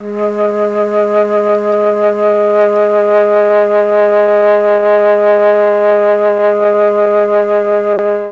G#1 TRON FLUTE, Mellotron; Mike Pinder - Mellotron Archive CD

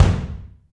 BD, BD REAL

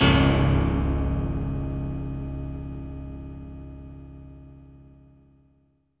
PIANO WIRE, Impacts, Hits & Booms; Distored Reality

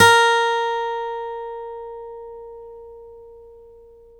STEEL F A#3, GUITARS; Synclavier Sampler Library

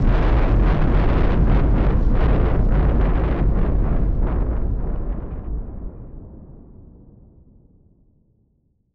Noise Bomb Rumbler; musicradar; bomb factory

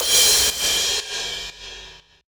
Crash; Equipped Music; Loungin House

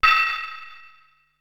Anvil Loop, Drum Machines Ensoniq SQ-R-Plus; 300 Drum Machines

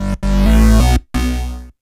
2 STEP 131 SYN, House Side Of 2 Step; USB Soundscan vol.51; AKAI

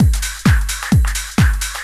drum 130 dance, drumloops; Techno Trance Essentials

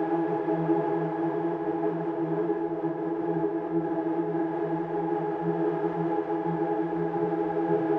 Drone; stereo toolkit samples; Non Tempo Loops

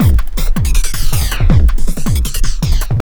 JUNGL 160, JUNGLE LOOP

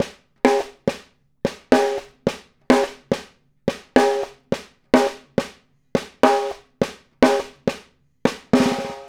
Snare Pattern, Drums; Essential Percussion

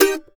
MANDO MAJ, D# MAJ L; Sampleheads; Whole Lotta Country 1 & 2

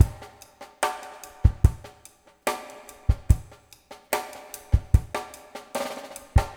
drums wet; DRUMS 53-84; Spectrasonics; Liquid Grooves 1 & 2

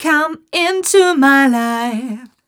f mel e flat, fem Vocals

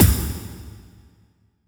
Verby Hit; Roland SPD-S

